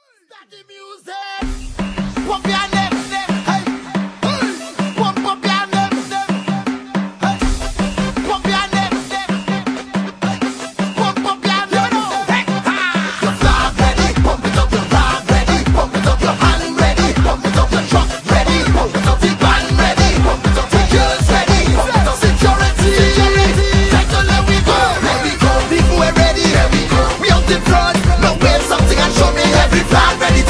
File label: soca carnival trinidad